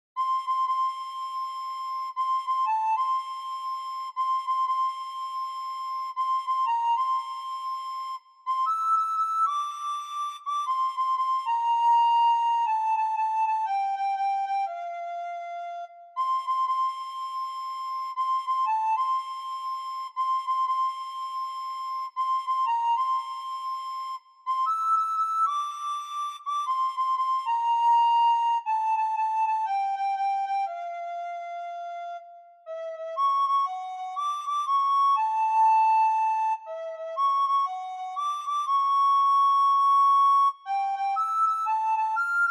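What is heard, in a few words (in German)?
Blockflöte solo, Sopranblockfloete solo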